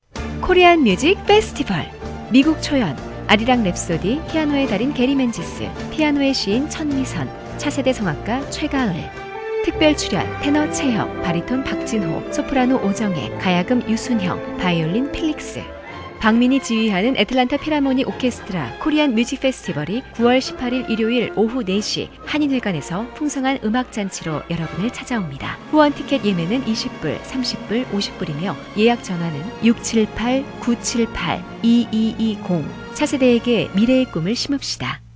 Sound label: Korean Music; Korean Music Festival